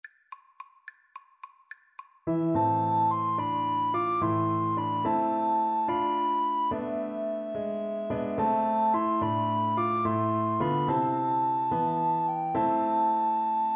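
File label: recorder karaoke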